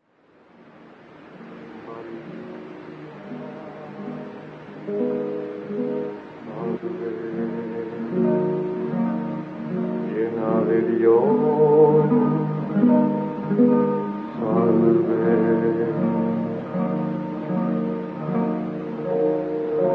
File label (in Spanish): Ave, Cantos camino neocatecumenal